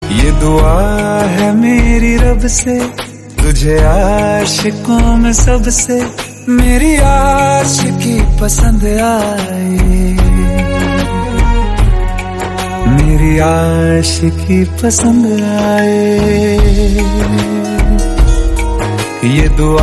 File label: hindi song ringtone, bollywood